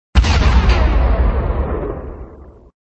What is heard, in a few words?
Sound SFX, flipper; sttng